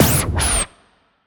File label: Gun